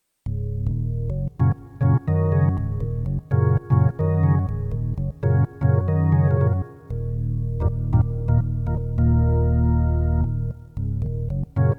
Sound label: organ